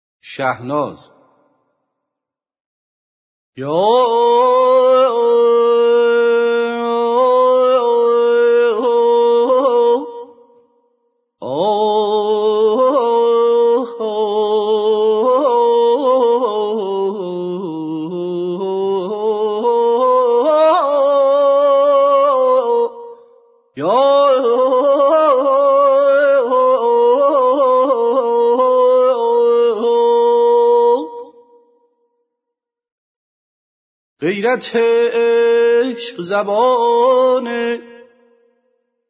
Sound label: shahnaz, shour